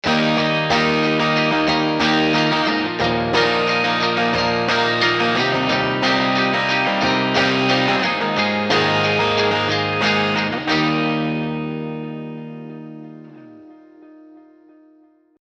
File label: large tweed combo; logic pro; amp designer